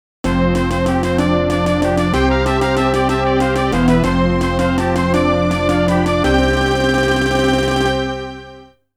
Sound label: Victory Fanfare; nobunaga no yabou haouden; daikoukai jidai ii